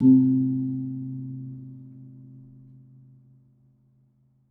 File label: Kempul, Gamelan